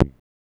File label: Perc, Rattlesnake Parametric Percussion System; BME Rattlesnake Parametric-Percussion-System